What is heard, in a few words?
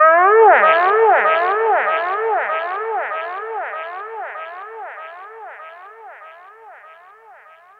Notification